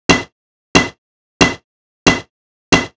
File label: stick; ancient